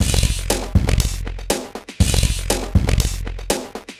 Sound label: Noise Beat; Drums & Drones